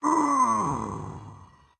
Pain; Caffiend Caelan; Pain Normal

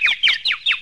Birdies; Laundry; Neighbours From Hell Console Edition; GC, Xbox

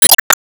Enemy Sandwall Block; disney star smash android ios mobile gamerip 2020

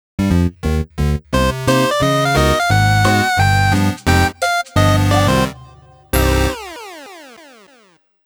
Game Over; Dead Ball Project vol.3 (2009)

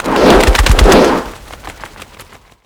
Winged Boots Room Transition; MagicItem WingedBoots SFX RoomTransition 01

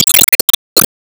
Sand wall Wall Appear

